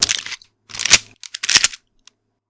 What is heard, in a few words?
Makarov 9mm Handgun Being Reloaded While Empty Full Sequence